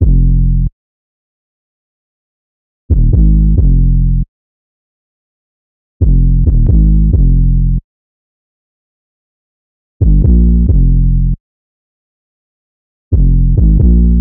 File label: 808 loop 135 Fm; Slate Digital BASSHOLE Sample Pack